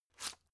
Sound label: drug pull out hard; arzt